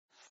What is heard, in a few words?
window appear